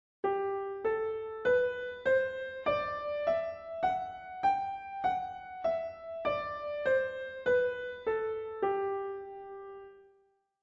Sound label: G Scale Piano; California Music Conservatory